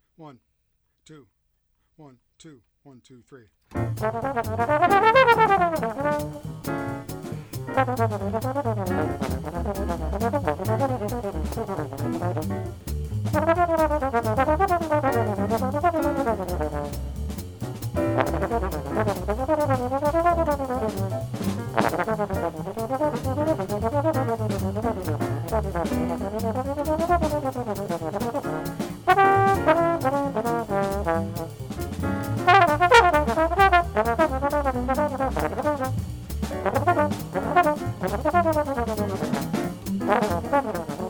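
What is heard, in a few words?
trombone fast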